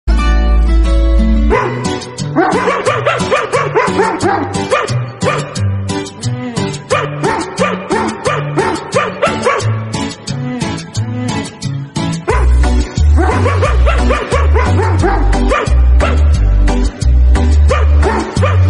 female dog, dog bark sound

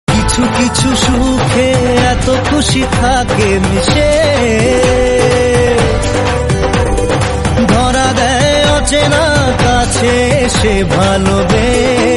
romantic bengali

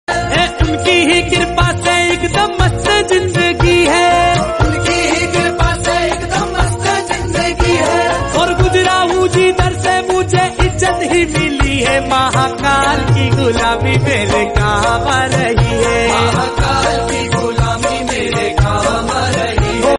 devotional hindi

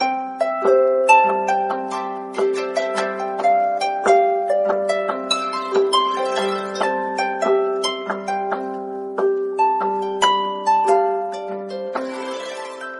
morning flower notification alarm

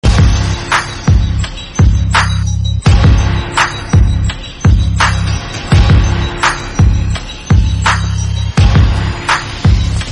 villain entry fight bgm; rrr

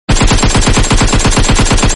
rapid fire barrage

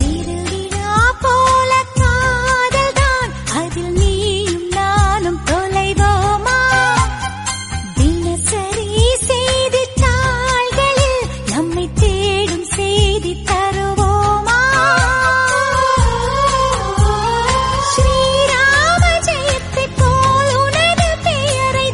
Tamil song

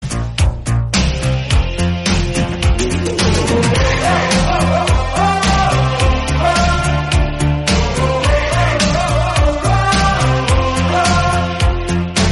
stylish boy bgm theme

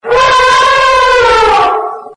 elephant tone

